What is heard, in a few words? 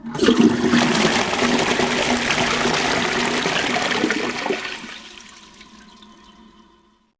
Toilet Flush; Max Payne